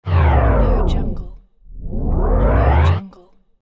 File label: slow motion